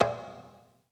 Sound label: djembe; eddyflux